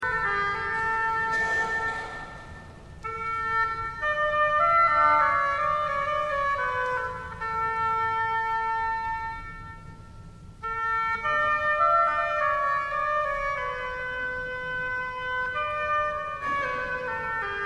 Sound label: Oboe